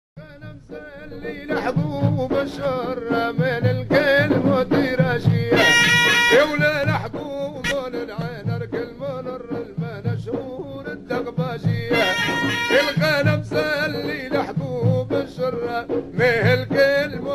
Alsalihi, Al-Salihi style singing in Tunis

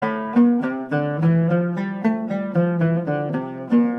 maqam bayati A Oud; Maqam Bayati on A

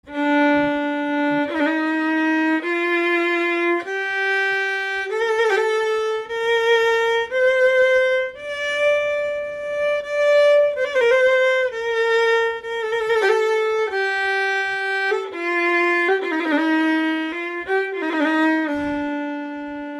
maqam bayati D violin; Maqam Bayati on D

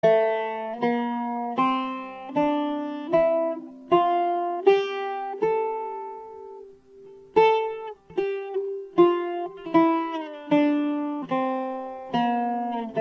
maqam bayati A Buzuq

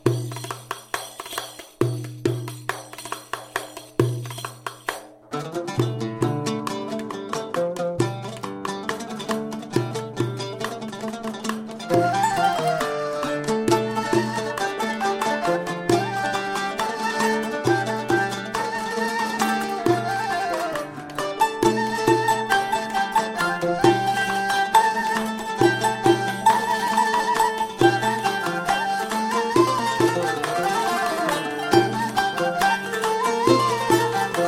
aqsaq insrumental

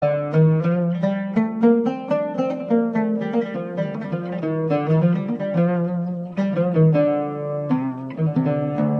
maqam bayati D Oud

